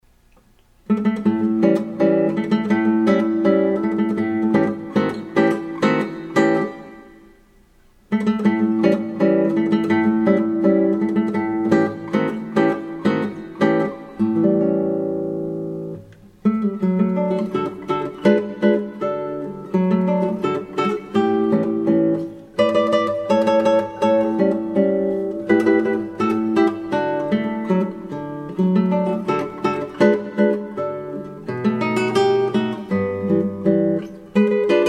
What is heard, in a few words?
Emperor Polka, guitar